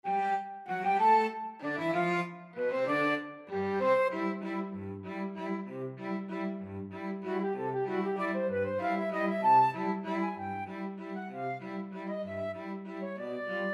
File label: flute violin cello